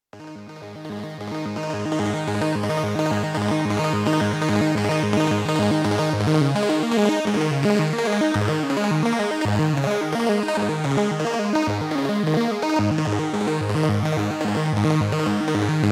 Triller; ARP1601

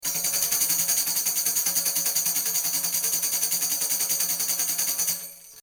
Sanken CU55 Tambourin 50cm UFX 90 Grad

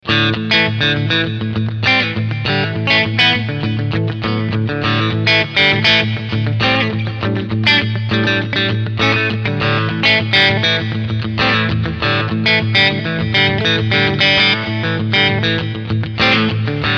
Clean Picking beide HB

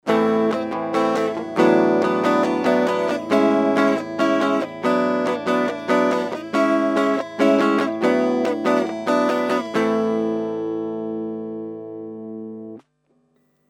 Gitarre INS Gain 20 HP 300 Hz; GAP Pre 73 DLX Premier

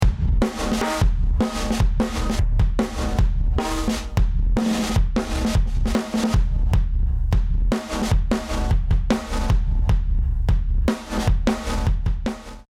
Drumbeat ohne Becken, schnellste Attack, schnellste Release; Waves CLA-76 Black

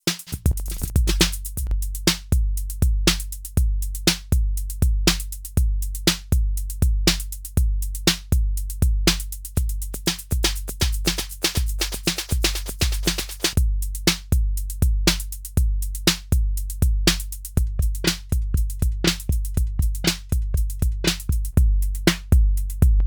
Bastl Thyme Manueller Preset Wechsel Beat

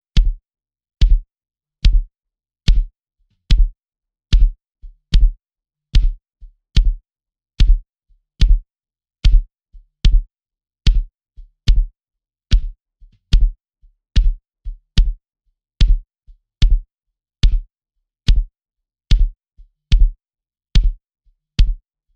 Bass Drum mit Transient Control

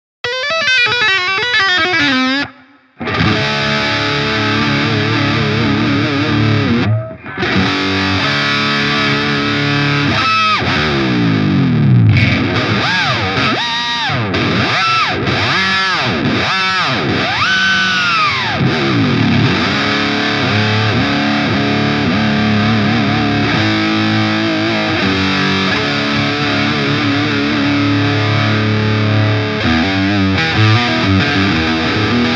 Charvel Pro Mod DK24 HH FR verzerrt Steg

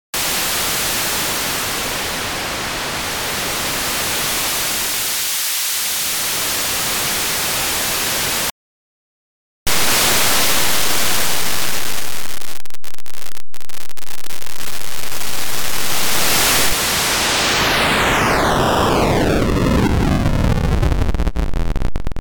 Noise analog und digital

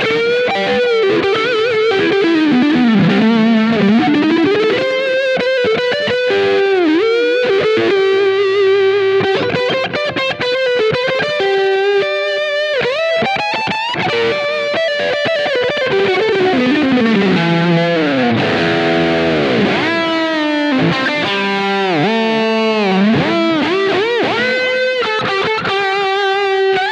Schecter C 1 Exotic 3 Pickup Positionen Split Lead

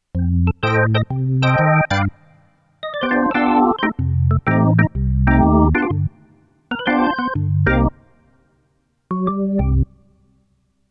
Roland RD-88 VK Orgel

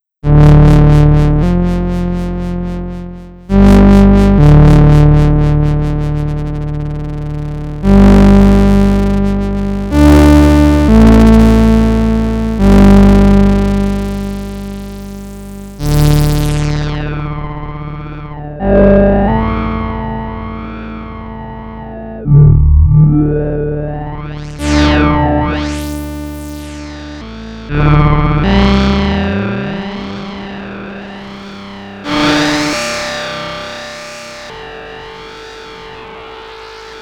Bass mit Turbo LFO

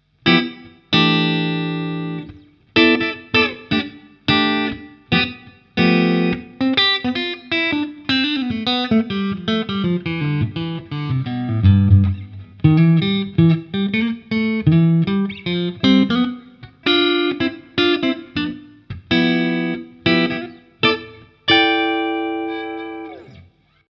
Halspickup clean

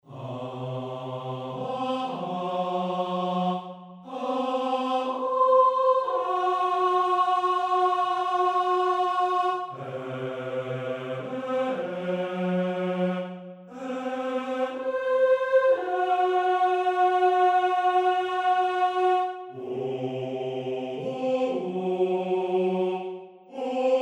Legato Vokale

